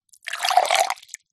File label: food drink water pour into glass